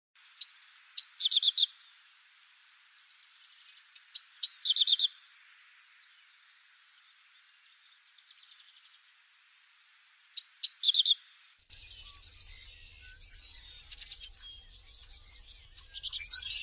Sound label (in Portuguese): Canto do papa capim americano